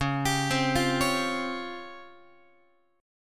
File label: C sharp Major 7th Flat 5th Guitar; C#M7b5; arpeggiated